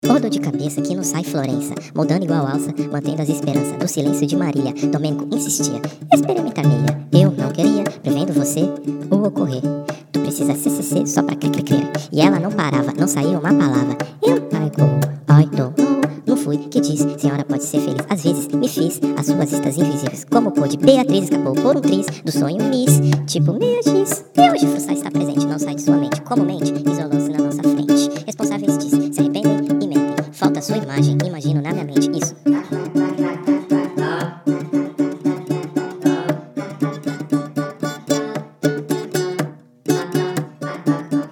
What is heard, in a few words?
bpm 178